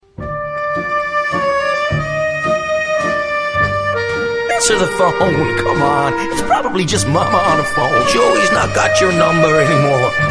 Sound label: Waltz